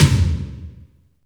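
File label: tom drum, right; Best Service; Real Mega Drums VOL-1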